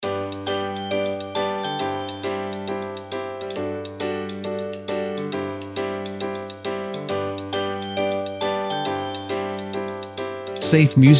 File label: Happy Joyful Loop; SafeMusicList